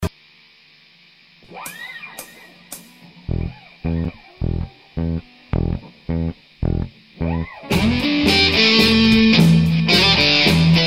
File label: Bridge solo